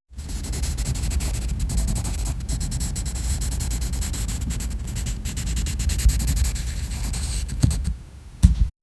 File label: the sound of a pencil, drawing